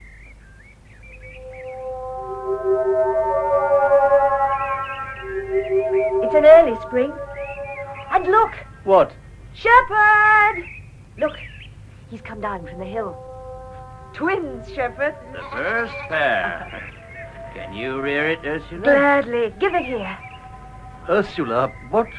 Dreaming with birdsong; It's an early Spring

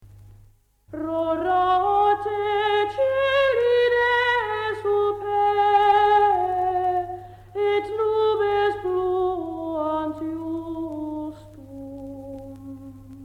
Plainsong Antiphon

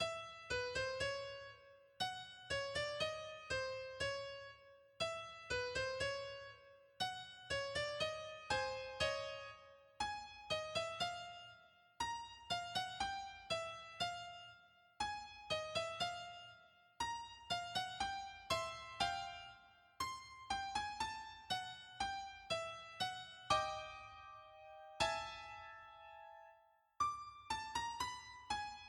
melody; Easter 64

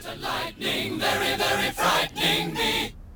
Vocals only

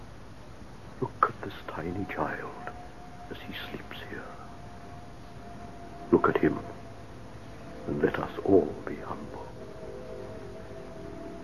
Ship takeoff and outro; Closed Planet

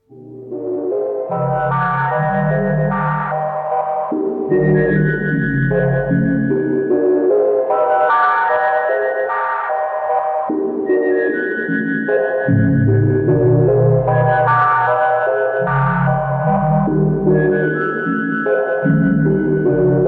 City Music

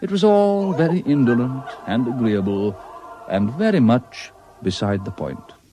Vocal Fanfare; The Bagman

